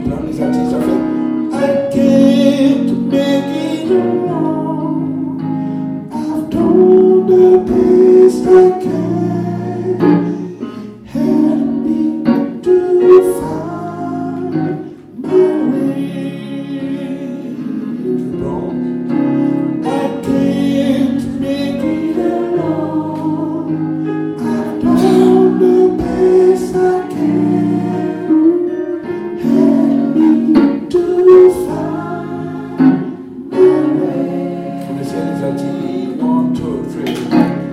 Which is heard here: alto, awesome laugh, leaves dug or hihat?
alto